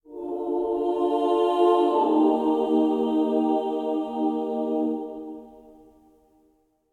choir seq

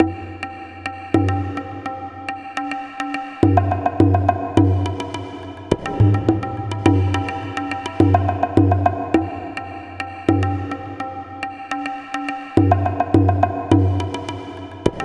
Smog Drums

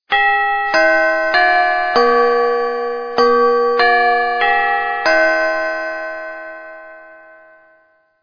6 A M Chimes; Five Nights at the Chum Bucket

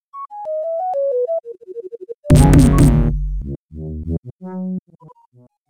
Sound Effect Level Complete; Taz-Mania Genesis

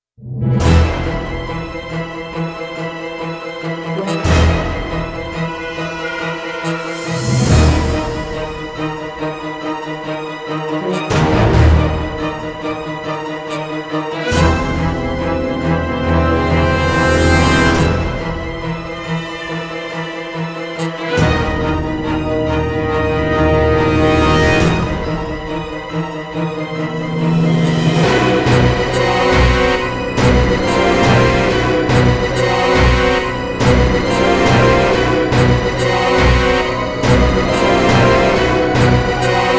Horror Chase

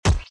axe body; out of reach treasure royale 2020 gamerip pc

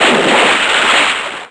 Splash; Twisted Metal 2

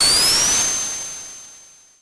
Retry Jingle; Friday Night Funkin' Tails Halloween

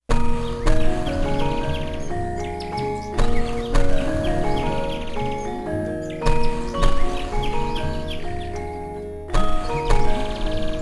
Gamelan; Flower, Sun and Rain

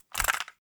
Cassette Rattle

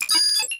Space Crit Stop

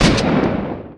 Shotgun Fire Single stereo; SFX FIREARM; Shotgun Model 04; The Looker

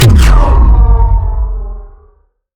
Shot Large Laser Ion Fusion

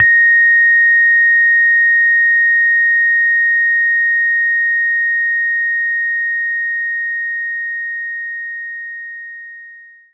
Rhodes Bb5; knights try

